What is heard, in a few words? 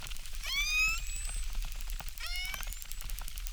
Chirp, acquarium